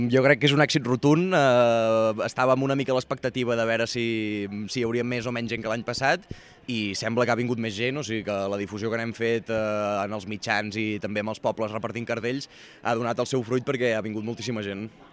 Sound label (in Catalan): Molta gent; All de belltall